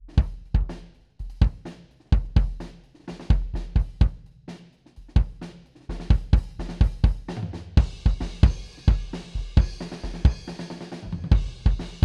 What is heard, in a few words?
kick distance blanket D112